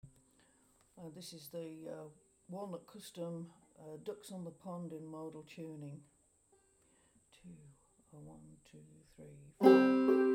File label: walnut custom, banjo